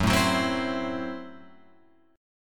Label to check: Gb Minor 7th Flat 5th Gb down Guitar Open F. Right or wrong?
Right